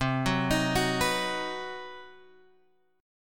C Minor Major 7th Flat 5th, Guitar, Open G; down; chord shape

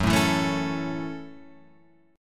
Gb Minor 7th, Guitar Open F; strummed; JGuitar

